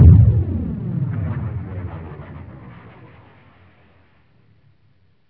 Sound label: thunder, synths; Rubber Chicken Software Sample Bank